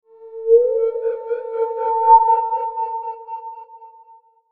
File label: Logo Eerie Whine; Sonic Logo Sound Effects; Production Element Imaging Element Accent Transition